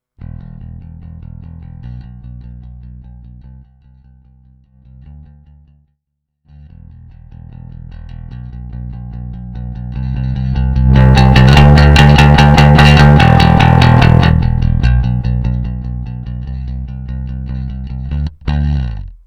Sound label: gain regler sweep; Trace Elliot ELF